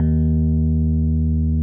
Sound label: RHODES D#1; Sonic Reality - Vintage Timetraveler